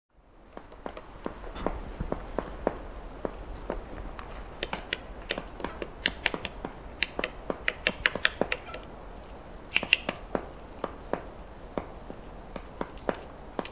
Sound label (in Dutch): magelhaenspecht